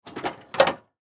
Lawn Mower Shift, Industry, Lawn, Mower, Shift, Gear, John, Deer